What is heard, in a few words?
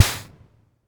Snare Reverb, Vermona DRM1-MK2